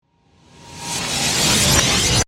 Riser Reverse Debris; Ambience, River, Loop; BlastWave

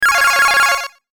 Descend Digital Beeps Sequence Fast; Descending; Blastwave

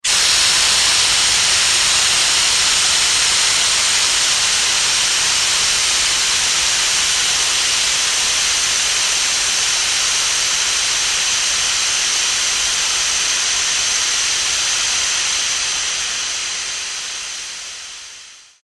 Industry Steam Blast Long; Factories

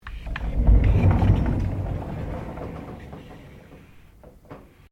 Door Sliding Interior Aluminium Glass Open; Various doors

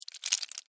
Insect Move Fast, Movement, Bug; Animal

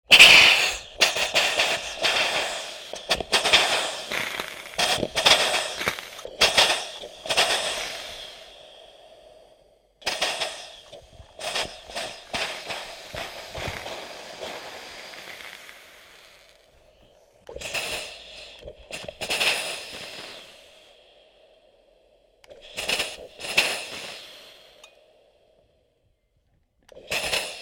Steam Hiss and Spit - Water onto hot iron surface; Hisses; Objects; Tom Hutchings

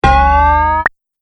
Cartoon Stretch And Pop; Cartoon Sound Design Sound Effects

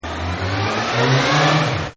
RISE; Sound Design; Partners In Rhyme

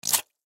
Syringe Liquid Squirt Needle; Hospital; Emergency

